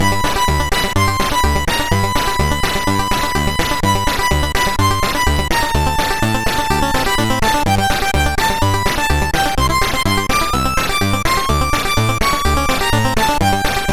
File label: Final Synth Sample, MOS6581R3